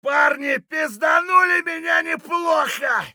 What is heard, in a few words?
hurt light; Escape from Tarkov